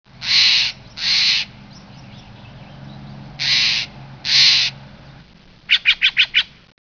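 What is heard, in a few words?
steller's jay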